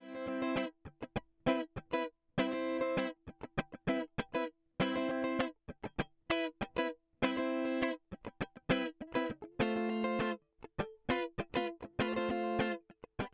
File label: Guitar Rythm Comp